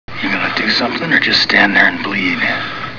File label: bleed; svencoop; cyborgaudio